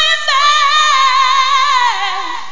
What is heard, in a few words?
SCREAM, VOCALS; Groovy Bytes: Behind the Moon